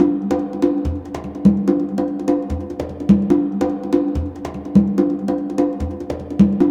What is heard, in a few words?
CONGA BEAT; Zero G - Ethnic; CONGA BEATS